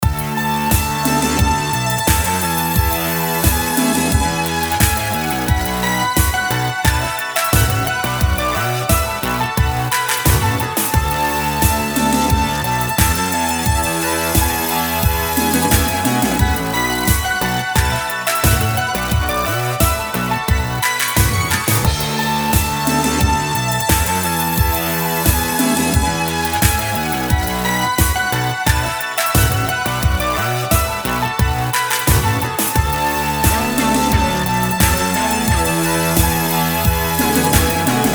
retrowave mix

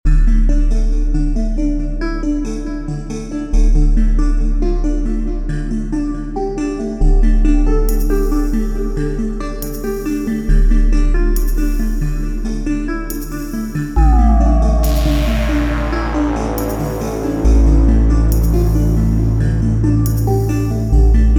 electronic chill at night instrumental

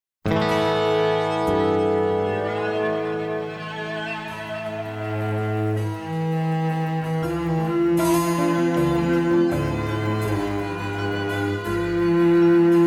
instrumental

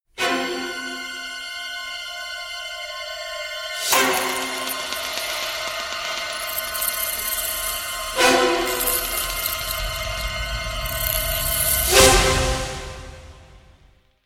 string shrieks

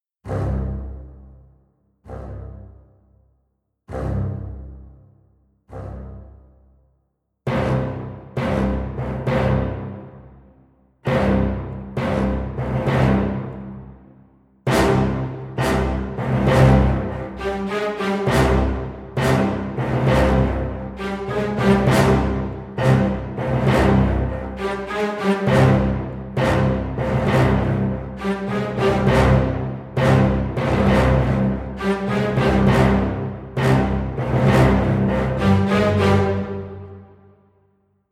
orchestra stacc stem

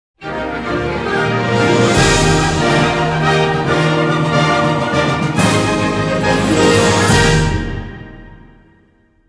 boss stage clear

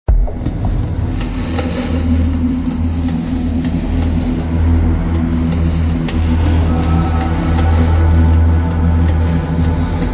ambience music

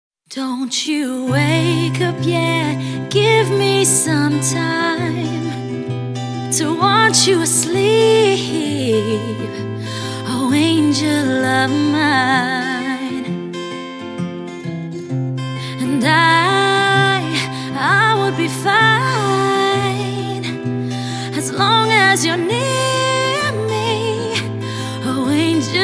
acoustic

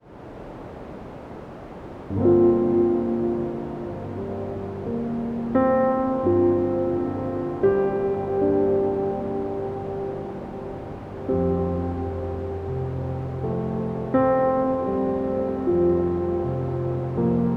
piano, white noise

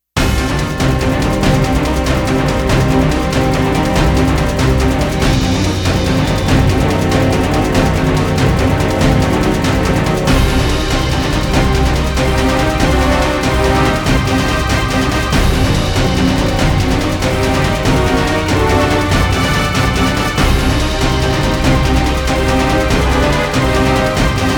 no choir

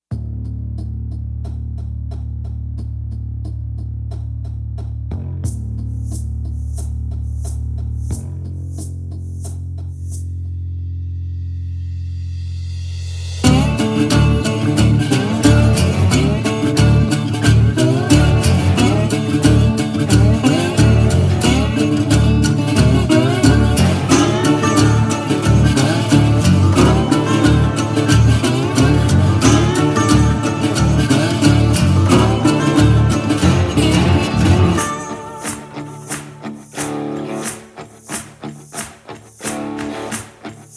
swamp land no drums

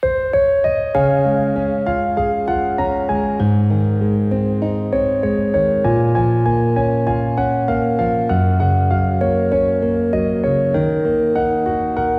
piano arrange

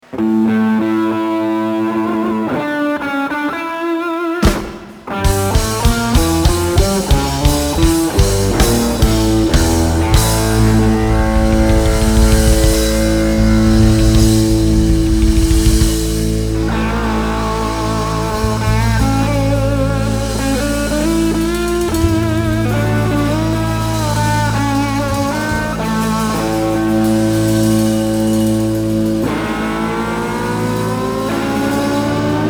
drum bass and keyboard solos

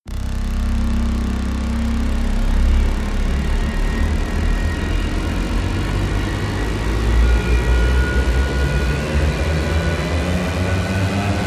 rise long screamer

